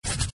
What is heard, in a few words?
rub